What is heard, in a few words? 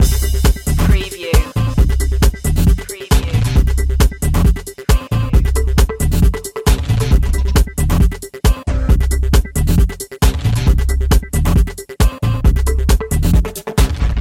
human machine loop